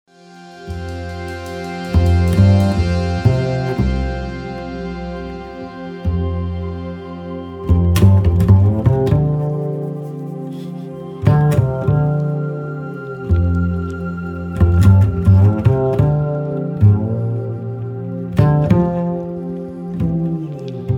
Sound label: bassmood